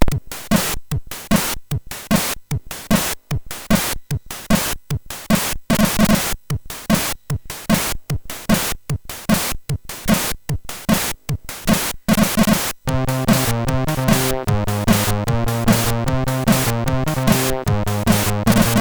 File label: Bass, MOS6581R3; sid